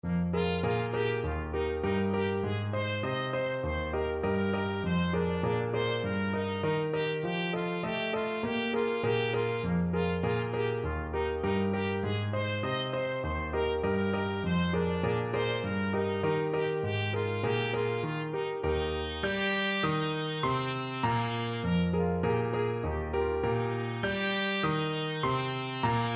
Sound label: can can, trumpet; offenbach can can